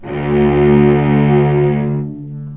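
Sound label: cellos attack D2 L, String; East West; The Ultimate String Collection; VCS ARCO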